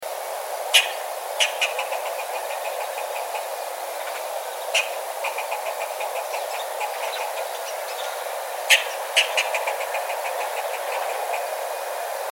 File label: eastern red squirrel chitters; A dry chattering